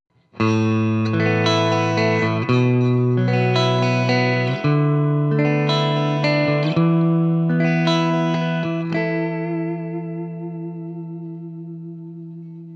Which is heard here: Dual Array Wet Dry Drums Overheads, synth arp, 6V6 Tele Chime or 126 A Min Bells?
6V6 Tele Chime